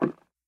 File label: soldier land; toystory; sarge